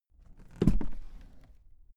suitcase open case soft

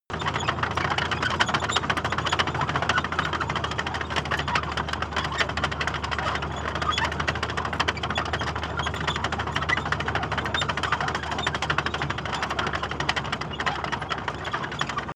tank large tracks rattle medium

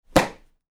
glove catch

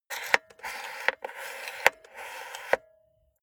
cassette player antenna extend slow

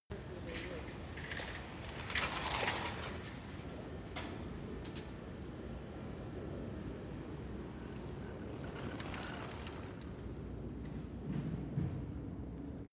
city europe bicycle close

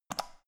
power button turn on off hard fast

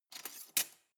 cutlery large drawer rattle soft

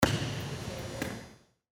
volleyball overhead serve

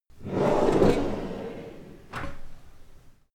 closing drawer

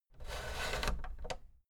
fader plastic scrape double down medium

